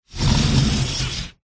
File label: household sliding window slide open or close; zapsplat